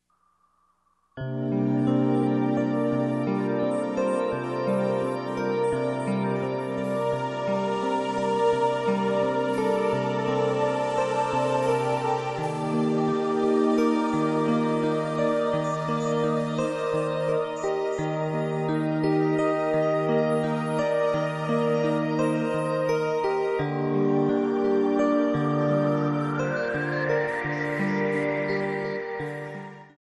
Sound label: Ancestral Voices, relaxing music; Album: Nature Music; spa music